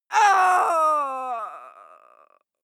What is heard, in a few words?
female fight cry die; schreie; menschen